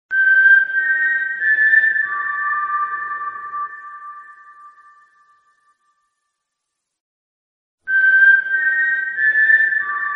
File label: parachute, bgm; hunger games